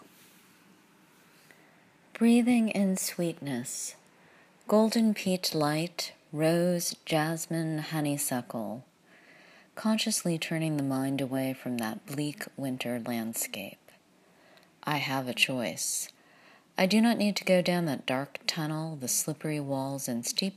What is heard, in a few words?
Breathing in Sweetness